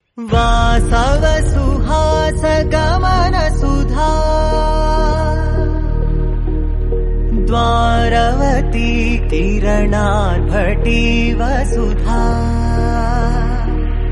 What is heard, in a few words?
bhajan